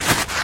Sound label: Casket show